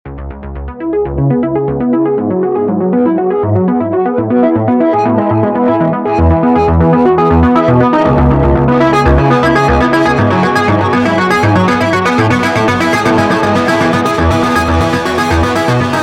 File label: lead arp wet; chiral audio